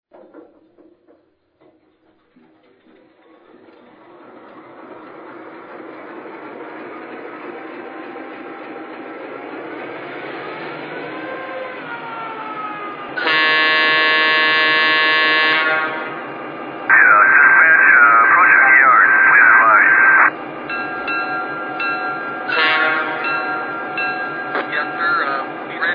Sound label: GP9